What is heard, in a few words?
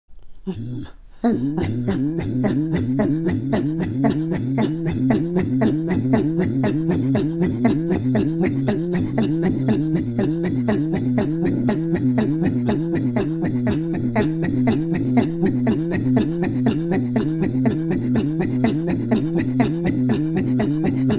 Throat Singing, INUIT